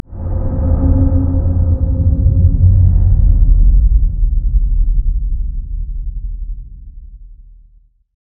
nexus power up; LoL

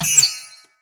frostshot; LoL; ashe skin06

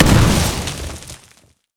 pirate cannon barrage boom; LoL; gangplank

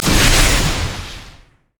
guardian spell attack; odin

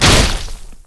basic attacks; Vi

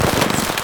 fireworks gatling gun; Fireworks Corki

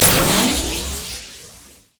accel; LoL; jayce

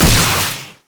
heat seeking; LoL; battlecasturgot

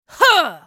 effort; FallenAngel

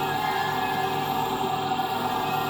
astral blessing loop; LoL; soraka

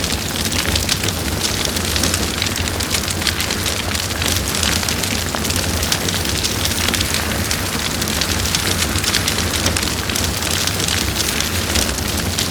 brazier fire loop

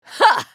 ultimate effort; Fiora

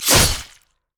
basic attack sword; rengar

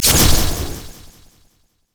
critattack; LoL; yasuo skin01

